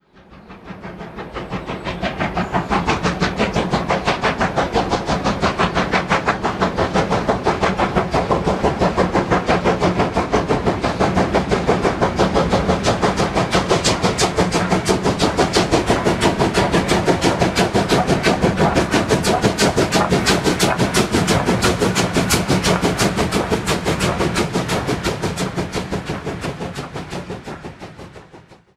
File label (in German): Dampflok Fahrt Sound Geraeusch